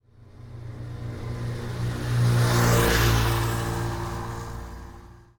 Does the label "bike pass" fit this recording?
Yes